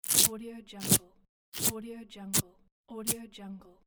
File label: hook and loop quick rips; maleo113